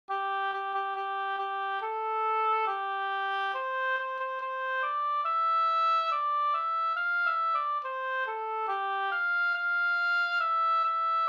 brudmarsch; fr Tolg; e J Johansson

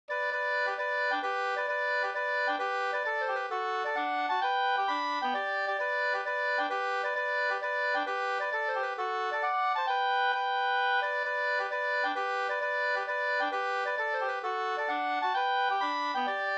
hambopolska; Erlands 2a hambo Flen018 2st

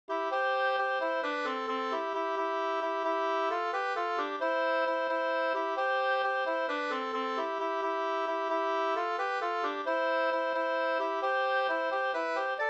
vismelodi

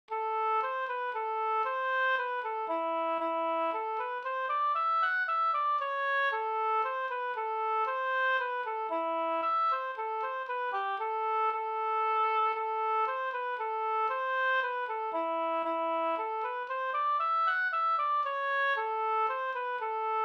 polska valspolska; Annas valspolska e Ljuder Anders Ersson Mora

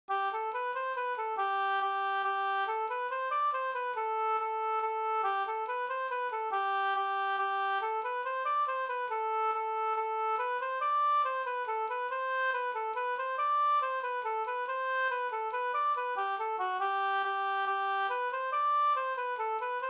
folkdans; Stampdansen Ahlstrom212

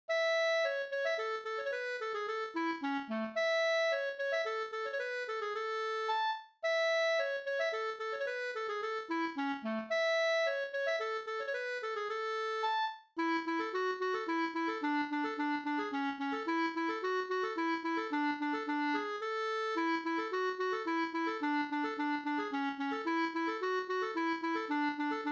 slangpolska

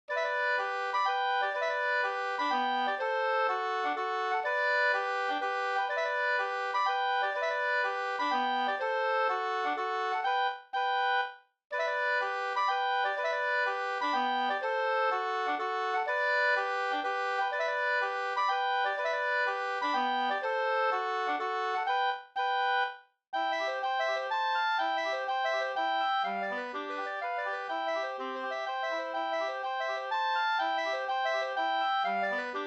polska, 2st; e Gustav Skoglund StoraTuna

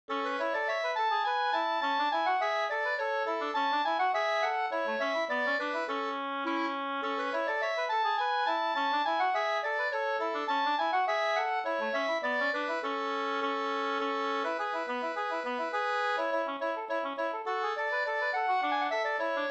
slangpolska

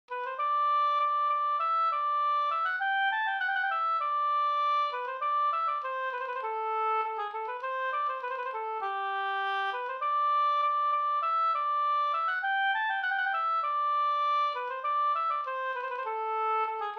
snoa, G dur

